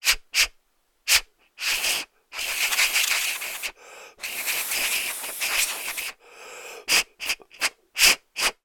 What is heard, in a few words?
human blowing into plastic cavity blow dust dirt out